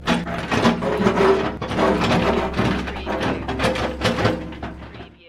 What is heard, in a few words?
Metal Stress - Rattling, Scraping Metal With Concrete